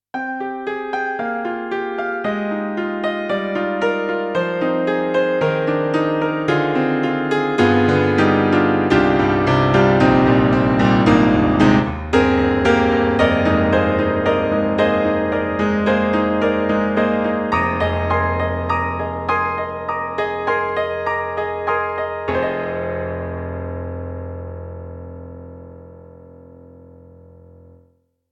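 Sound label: General MIDI Piano; Roland SK-88Pro; GM Piano1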